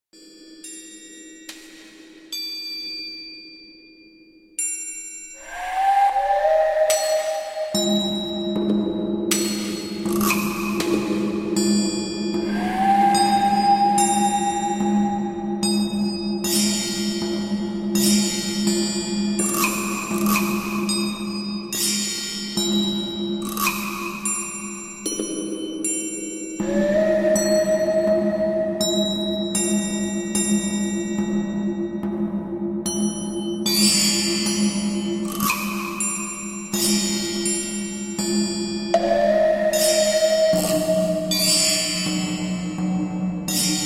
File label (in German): mystik